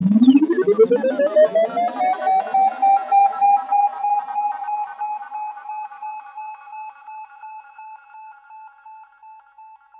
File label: dubble bubble tone